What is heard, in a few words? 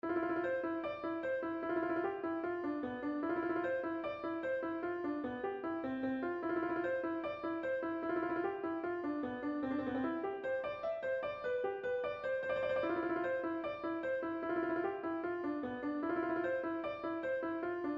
Mandolin Melody